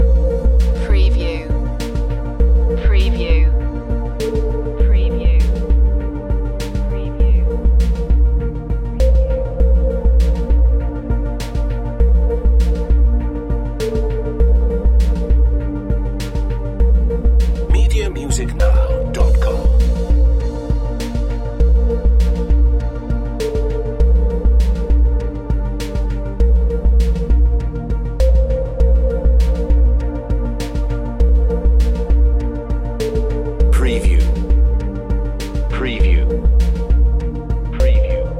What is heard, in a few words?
space age loop